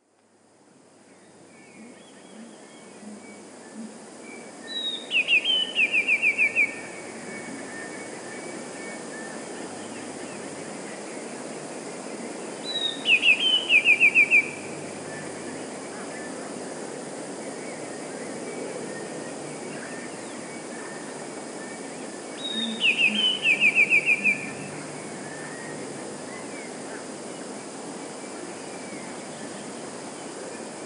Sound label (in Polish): spiew; dwukrotnym zwolnieniu i obniżeniu o oktawę